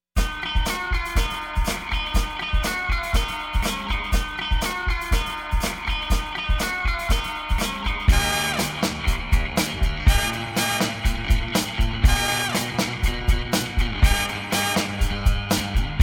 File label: Instrumental; Placement Music; PM USA